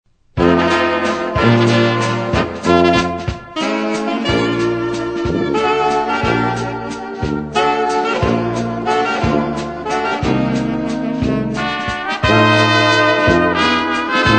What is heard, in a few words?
waltz medley